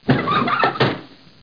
Roof Open

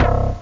mirage bass; OctaMED SoundStudio V1

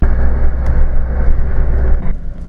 machine

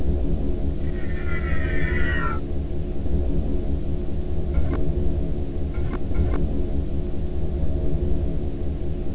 space; aggress

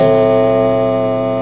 Old Large Clock; CManual-3.0

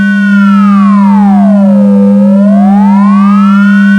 Cosmic Tone; Beyond the Wall of Stars; Ground Control

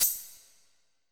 coin; Midwinter II; MIDI Music File

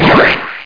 Sound HIT

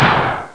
Whoosh; 3D Ultra Pinball

